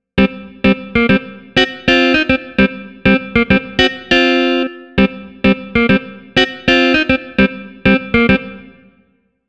Jazz Pick, гитары funk; сэмпл гитары

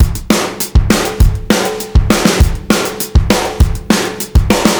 Fast; Ударные и барабаны (Рок, Поп); Rock & Pop

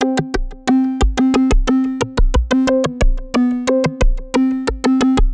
Arp; Сэмпл синтезатора (Легкая музыка): Arpeggio

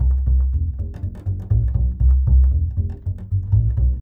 Quickwalk, Jazz

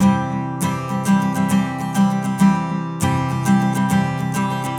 Chords, rock-pop

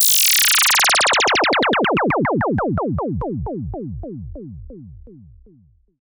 Downwards, downbeat

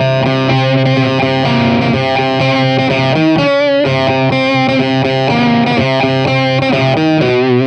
Dark Lead, break beat

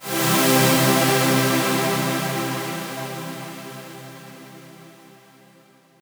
Stab; downbeat

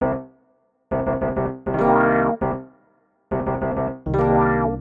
Dist Wah, 70's Funk

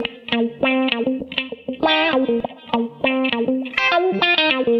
Groovy Guit; лупы

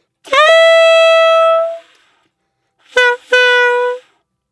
Sax Solo B

